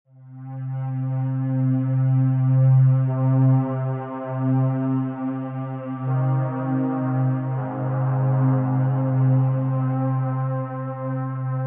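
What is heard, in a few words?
Day Ambience Stinger; dying light gamerip 2015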